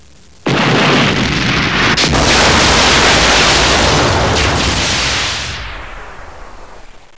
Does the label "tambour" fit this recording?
No